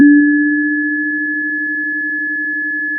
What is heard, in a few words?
pop, Sound of a church bell